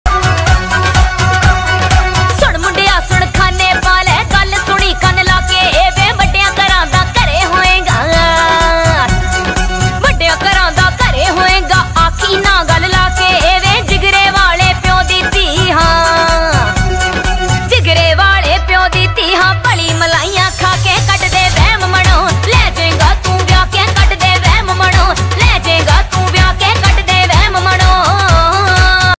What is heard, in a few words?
punjabi ringtone